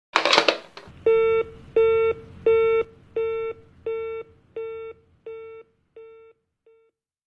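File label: phone hang up sound; suara telpon mati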